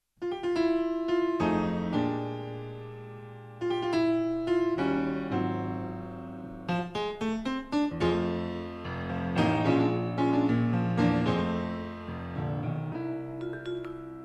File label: Quartet